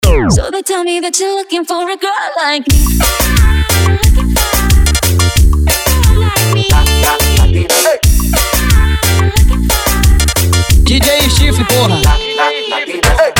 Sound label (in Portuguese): ROCK DOIDO